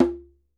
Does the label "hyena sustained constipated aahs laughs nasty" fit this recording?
No